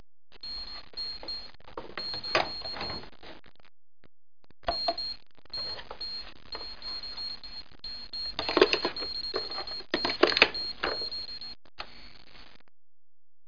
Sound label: defib